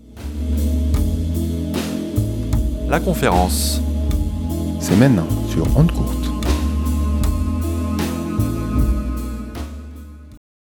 jingle; laconf

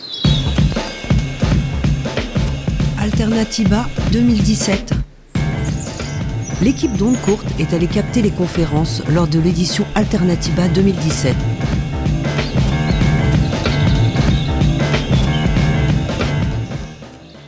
jingle; alternatiba

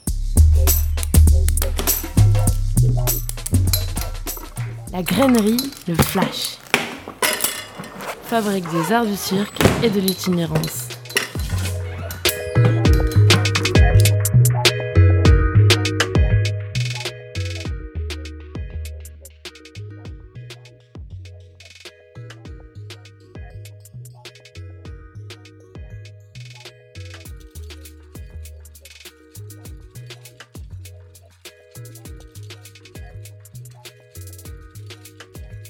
jingle; flashgrainerie

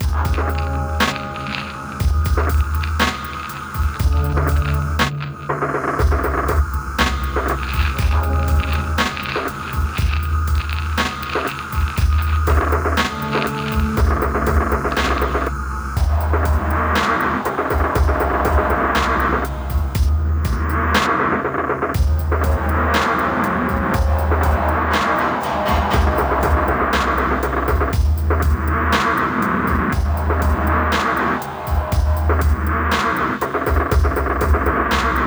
Dark reggae

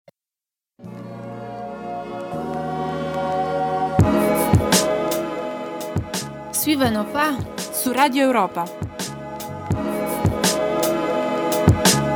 jingle; radio europa

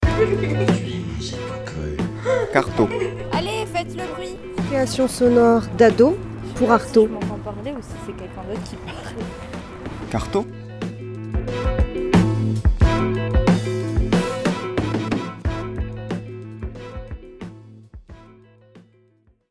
jingle

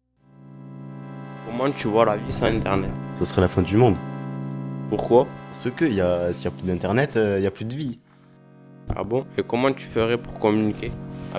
jingle; Bitstep

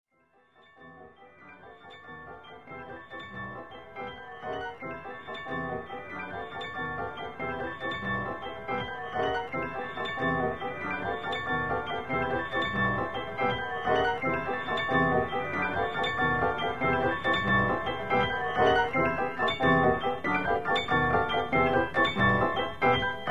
Ragtime

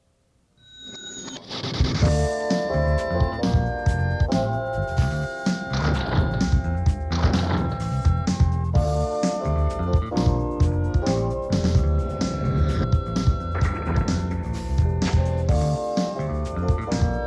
worldmusic

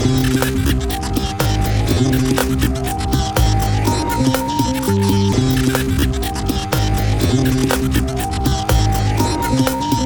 Utopia Hymn Instrumental

